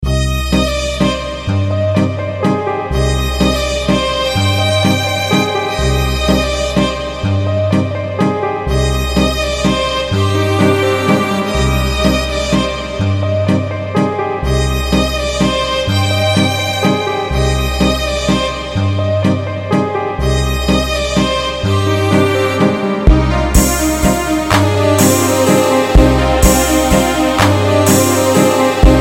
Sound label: Love Theme Music